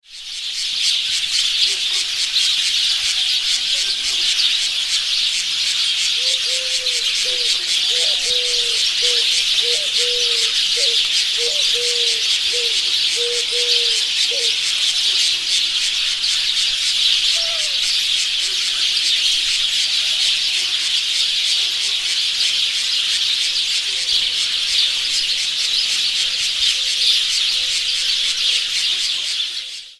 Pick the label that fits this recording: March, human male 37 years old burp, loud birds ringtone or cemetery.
loud birds ringtone